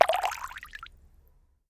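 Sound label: water stream active muffled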